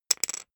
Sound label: poker chip fall wobble short